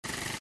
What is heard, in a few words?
Aircraft Fire